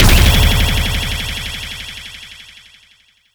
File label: IMPACT, fx